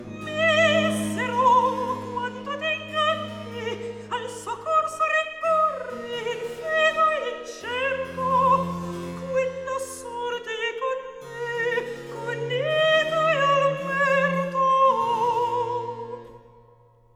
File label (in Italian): Recitativo; Misero, quanto t'inganni; Alessandro Scarlatti; Duet Cantatas